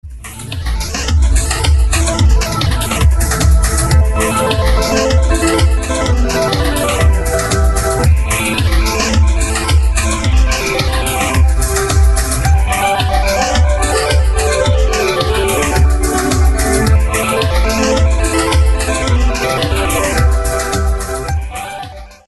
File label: pixel stock ringtone